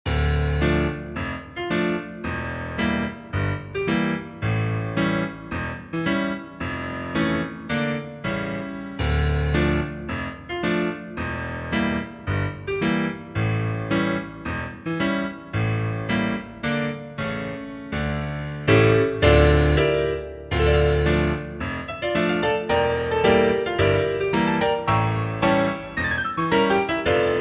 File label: piano